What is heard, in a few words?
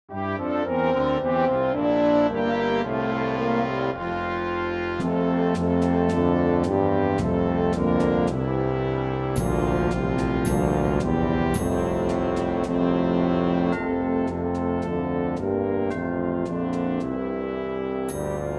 Tuba track with Melody; Good King Wenceslas